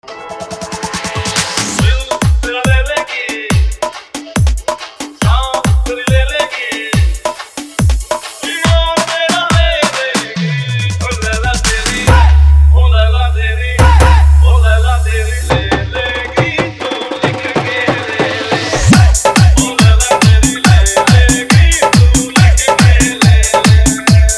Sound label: Faadu Dhollki Dance Mix